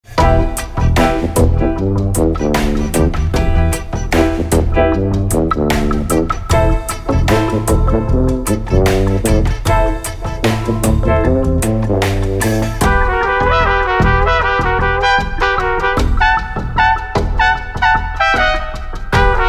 Bavarian Reggae

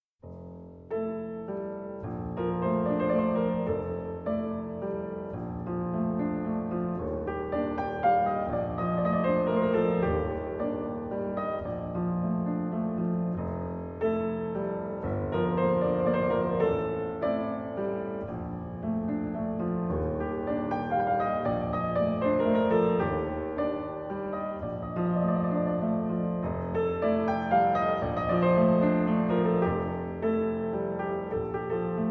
Valse pour – Waltz for

